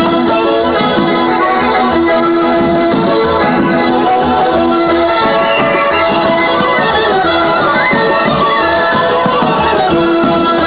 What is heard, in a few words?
dance